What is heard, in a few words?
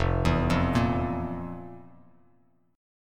F Major 7th Suspended 4th F, Bass Standard; FM7sus4 arpeggiated; JGuitar